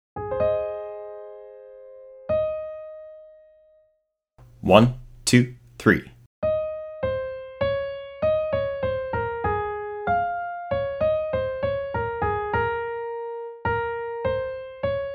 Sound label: Sop 1 (key of Ab); Sop I scale; Alma del core; all-state